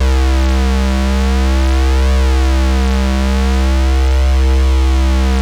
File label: BROOMSTICK; Masterbits; Vintage Voltage